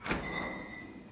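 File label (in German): kühlschrank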